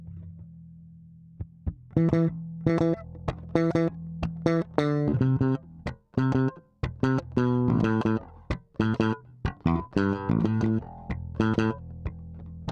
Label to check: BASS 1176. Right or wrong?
Right